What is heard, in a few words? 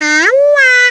Honker Cute